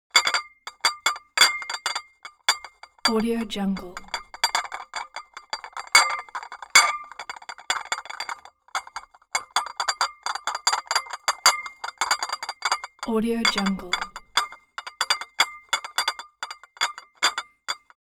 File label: object household dishes cups rattle jingle